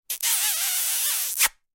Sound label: kisses